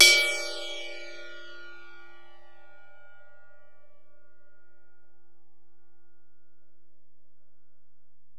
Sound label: Ride, Alesis DM-PRO